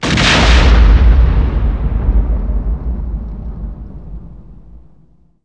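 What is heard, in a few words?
combat explode yt1300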